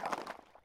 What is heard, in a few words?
mud walk, step